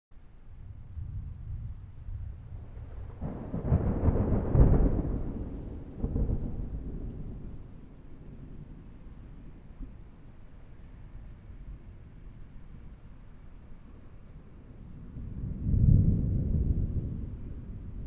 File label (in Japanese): kaminari